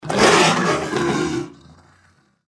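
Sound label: deathclaw power attack; npc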